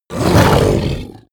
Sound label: dragon hit; kreaturen